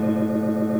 cheesy computer hum

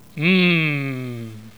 mmm, scientist

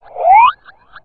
alert, houndeye

